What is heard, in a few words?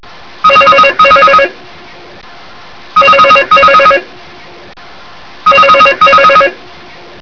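zvonok domofona, intercom doorbell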